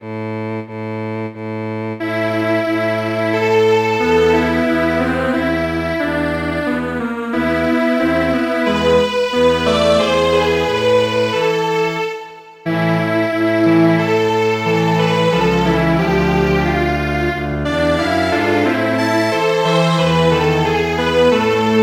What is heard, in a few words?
Strings Quartet, midi traditional; Wishing you a Merry Christmas